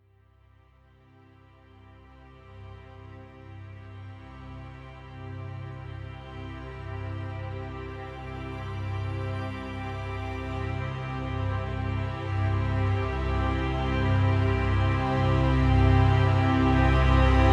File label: Orchestra Climb Mild Horror